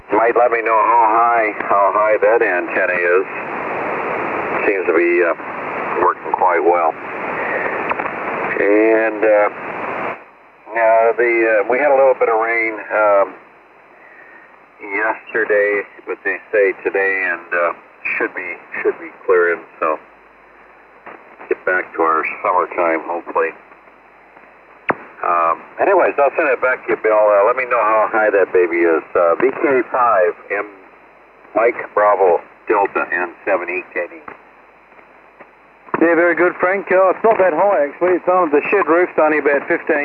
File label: FT747 softmute